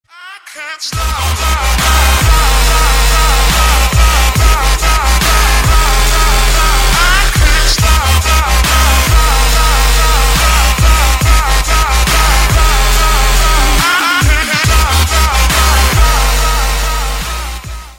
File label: dubstep